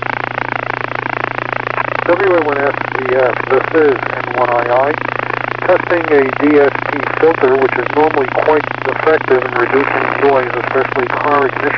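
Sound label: ignition noise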